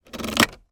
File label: car automatic gear stick move engine off daewoo nexia automatic